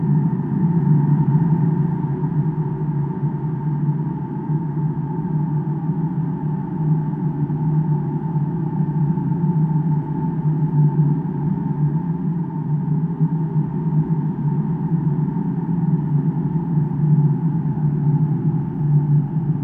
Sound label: amb menu